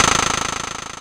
Synth Perc; Dream Zone

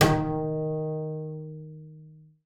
BING D#3, BASS FINGER; Xsample VOL-5

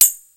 CHEES HAT, TEC INDU PER; Crypton - DrumBase5000

